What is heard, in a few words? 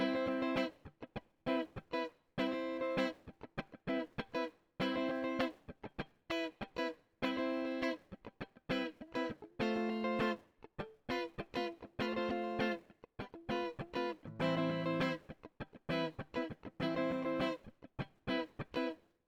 Guitar Rythm Reverb